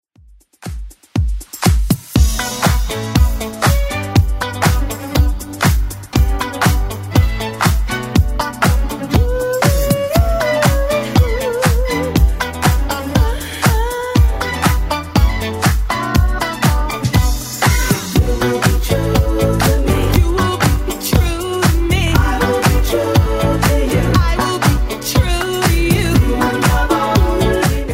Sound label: 80s Club 120 bpm